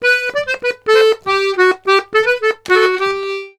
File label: Accordions, BOUREE; USB Soundscan vol.40; AKAI